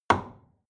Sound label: wood wood staff indoors